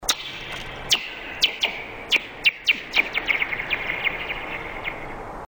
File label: ice